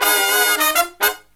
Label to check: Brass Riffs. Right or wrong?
Right